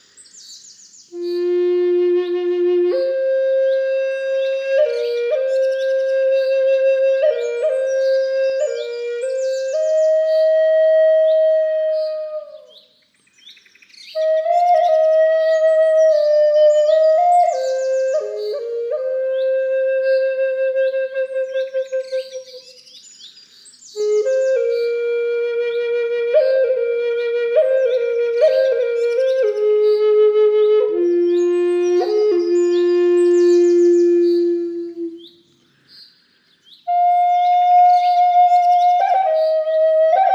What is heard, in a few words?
Lakota key F middle, flute; lakota flute in key of f fa sharp